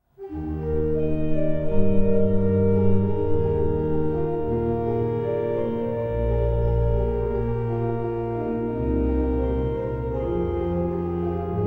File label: Triosonate II Largo